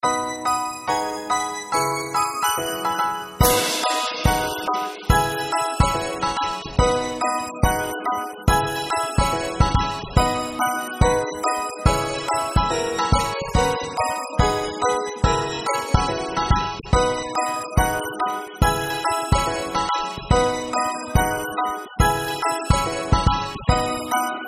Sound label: jingle instrumentals